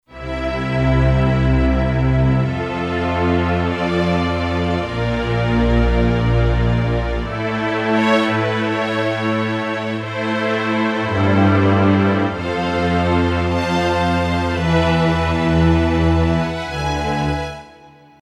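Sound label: Slow Classical Strings, Kurzweil K2500X; Factory Programs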